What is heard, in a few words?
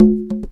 Single Hit, Conga; percussion; musicradar